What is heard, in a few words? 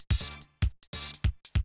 beat